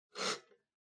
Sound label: Kitchen Scale Needle